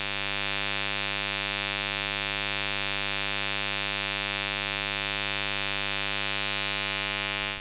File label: Electromagnetic Fields